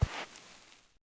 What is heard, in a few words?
Foot Sand; Civ4 warlords